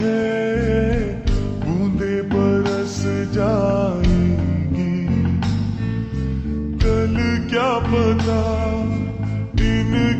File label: Slowed Reverb Ringtone